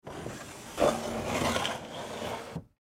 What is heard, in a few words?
Chest Drawer Open, Wood; Drawers; Household; Blastwave; Foley